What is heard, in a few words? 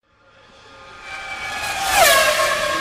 Formula One Sms